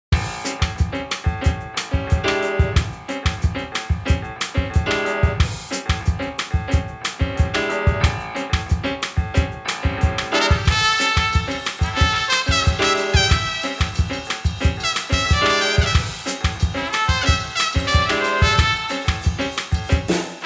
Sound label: Trumpet